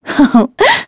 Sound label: Vox Happy; kingsraid; voices heroes en